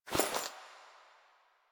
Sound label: Body Move; Frumentarius; Warframe; Ambience; Nineteen Ninety Nine; DE